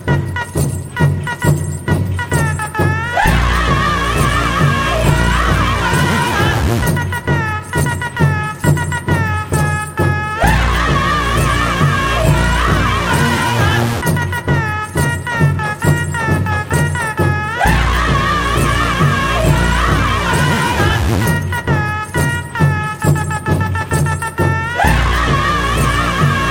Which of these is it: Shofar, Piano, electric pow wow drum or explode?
electric pow wow drum